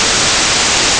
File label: noise